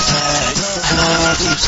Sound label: alarm; pesterchum; sbahj